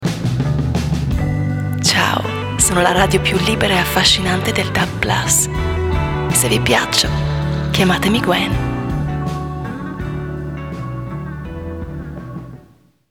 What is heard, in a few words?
Jingle; Radio Libera